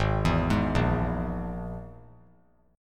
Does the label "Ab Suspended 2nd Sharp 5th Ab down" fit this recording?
Yes